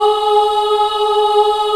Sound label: G#3 CHI OH-L, Choir; USB Soundscan vol.28; Acoustic & Synth; AKAI